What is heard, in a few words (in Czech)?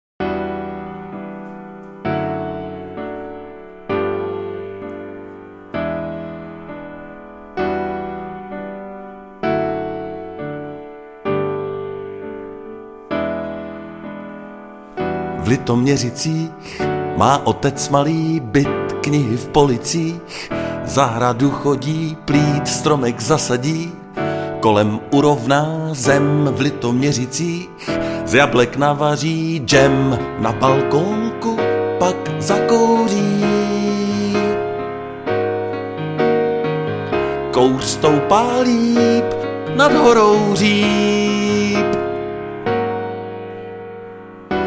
klavir